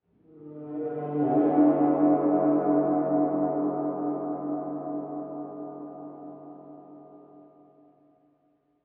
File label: Horror Gong; music